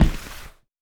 Sneaker scrape

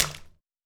Puddle

